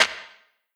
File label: snare wide; Hip-Hop; Drums; Drum One Shots; Slate; CAICOS Hip-Hop Sample Pack